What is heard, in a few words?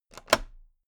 toolbox latch open heavy